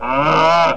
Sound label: cow; REDNECK; RAMPAGER